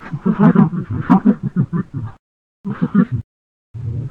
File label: run; I Am Weapon Revival; Jockergrimm